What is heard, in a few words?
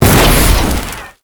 Spike Orb Fire Impact; Warframe; Tau; Circles Of Hell